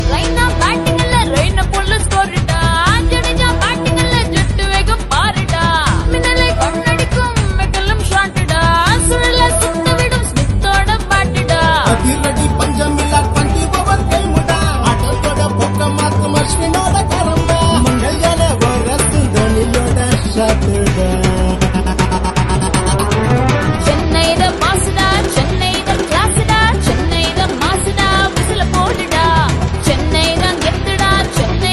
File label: Team Song Ringtone; IPL 2018; CSK